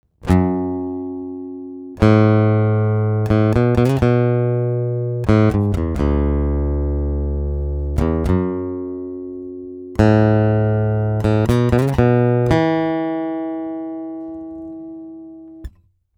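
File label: Acoustic, guitar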